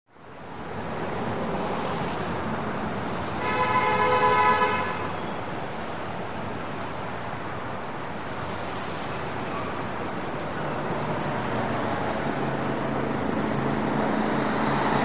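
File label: One Way Street Traffic, big city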